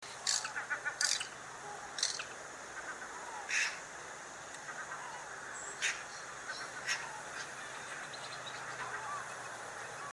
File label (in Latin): Pica pica; SETE